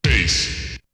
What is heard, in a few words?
Vocal; ZERO G - Datafile DISC 2